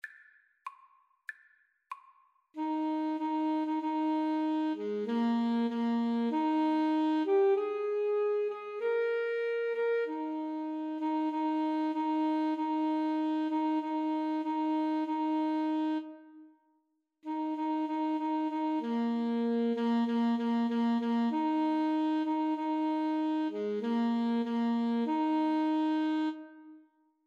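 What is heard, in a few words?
Alto Saxophone Duet, karaoke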